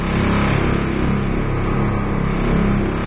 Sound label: zapper ambient loop; source engine; hl2; citadel